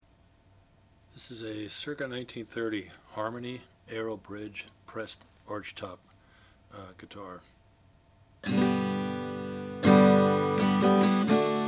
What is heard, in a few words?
harmony aero tobacco sunburst harmony aero